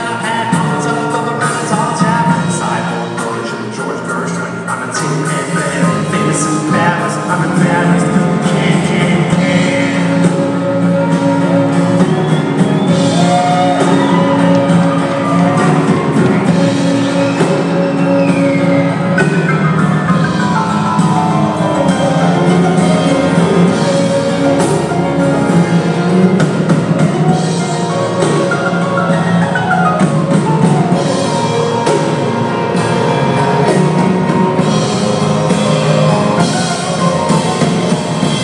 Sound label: mad pianist